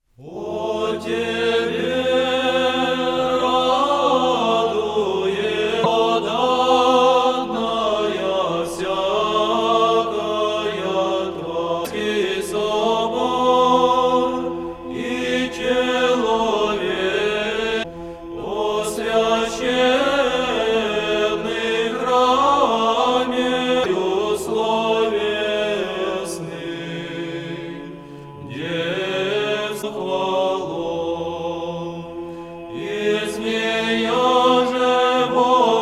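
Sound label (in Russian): Хор Братии Валаамского Монастыря, О Тебе радуется глас 8 греческий распев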